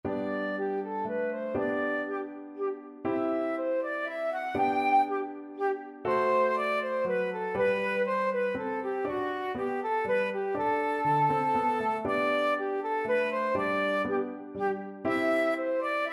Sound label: minuet, flute